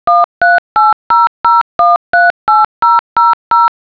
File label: Tone